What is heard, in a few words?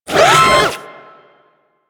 Thumper Bot Servo B; Warframe; Grineer